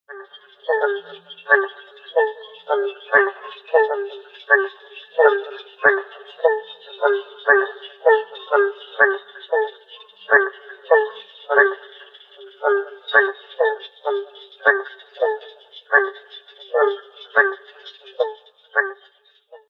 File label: Barking Treefrog; herps of NC; anurans